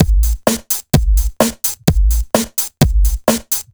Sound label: House Beat, 128 BPM; Loops; Vengeance Samples